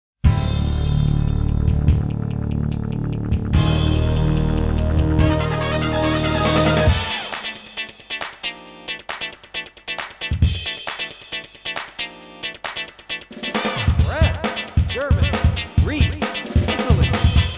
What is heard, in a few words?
Advertisement BGM